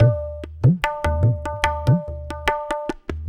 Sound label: Tabla